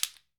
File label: AFRICAN, African Perc; E-MU Formula 4000 Series Vol. 4; Earth Tones